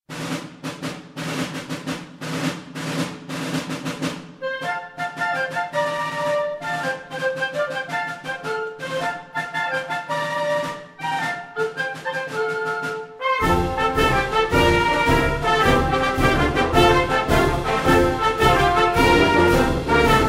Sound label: old french songs